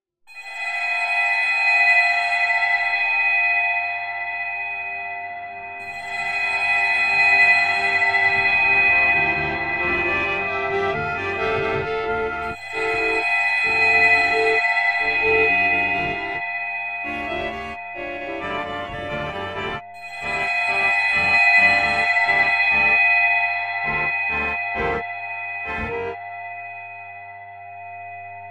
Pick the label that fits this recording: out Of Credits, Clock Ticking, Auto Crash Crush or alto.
alto